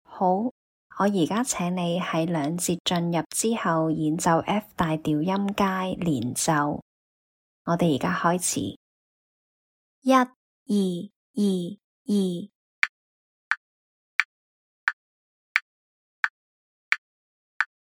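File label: F major scale legato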